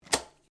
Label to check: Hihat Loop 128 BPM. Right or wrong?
Wrong